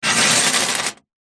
keys large bunch prison guard set down on metal surface hollow